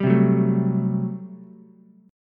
G Major 7th Suspended 2nd Suspended 4th G down Ukulele; GM7sus2sus4 strummed